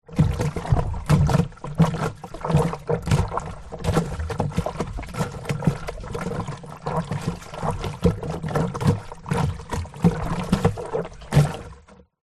tub plastic large empty floating water splashes inside perspective; foley; zapsplat